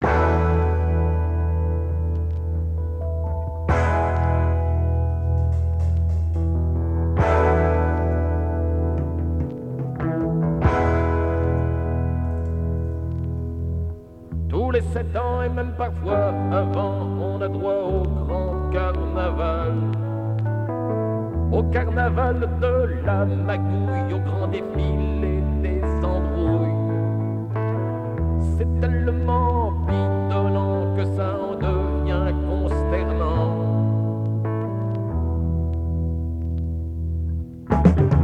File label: blues